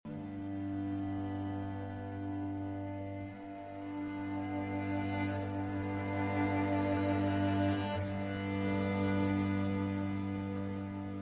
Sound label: String Quartet in G Major; Shostakovich String Quartet No. 6 in G Major, Op. 101